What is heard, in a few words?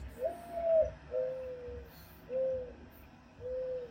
Mourning Dove